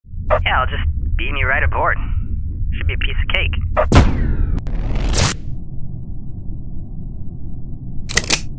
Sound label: Beam